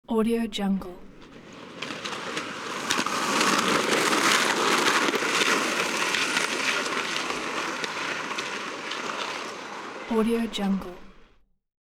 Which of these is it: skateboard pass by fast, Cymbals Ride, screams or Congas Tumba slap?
skateboard pass by fast